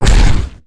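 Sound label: wolf damage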